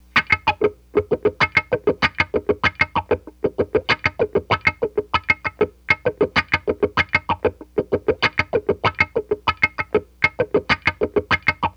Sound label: WAH GTR, Funk Gtr & Wah; PlatinumPhattCD2; E-MU Producer Series Vol. 8 – Platinum Phatt